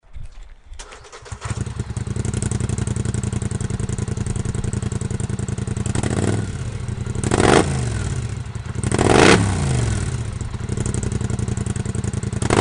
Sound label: polaris scrambler hmf sound clip